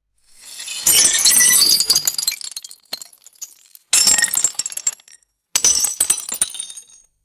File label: thick ceramic debris shattering and scattering; destruction